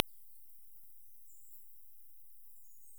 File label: Vespadelus darlingtoni